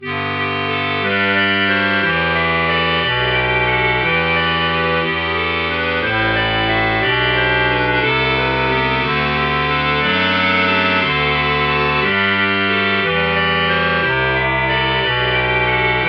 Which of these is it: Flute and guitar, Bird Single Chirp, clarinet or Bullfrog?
clarinet